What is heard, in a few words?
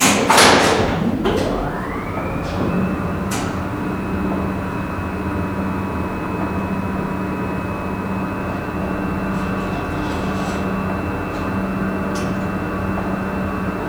elevator start move; mesa facility